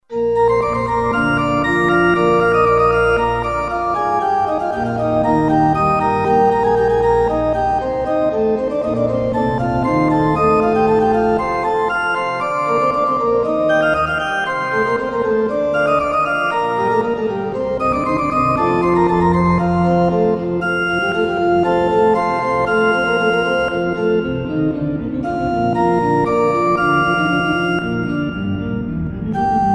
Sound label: organ pipe, yamaha sy99 synth